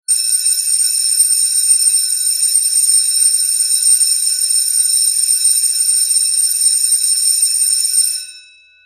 School Bell Ringtone

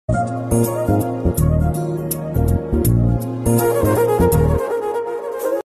Tamil Bgm Ringtone

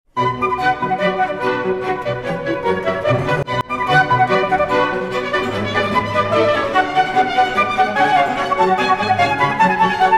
Classical Ringtone; Nokia

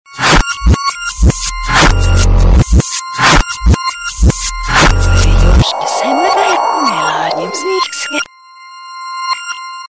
SMS Tune Ringtone; Samung

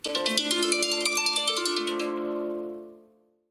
Harp; iPhone 12; ios 14

